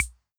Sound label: Clave, Farfisa Drums